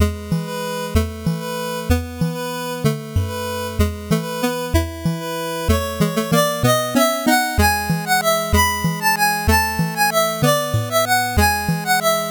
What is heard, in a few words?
8bit bgm normal